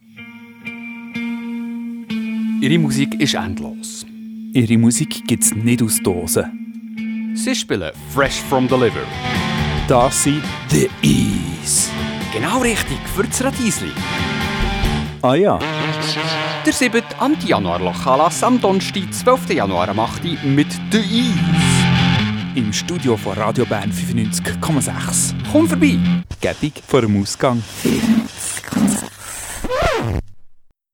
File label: Jingle